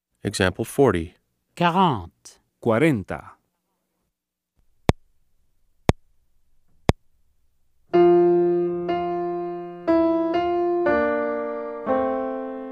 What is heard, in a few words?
Keyboard